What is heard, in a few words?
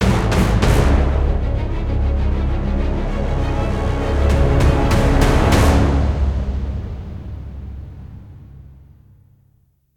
JRPG Death; Smite